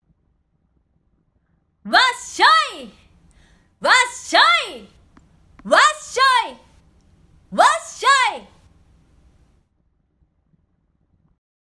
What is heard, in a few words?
voice wasyoi